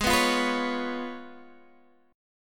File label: C sharp 7th, G sharp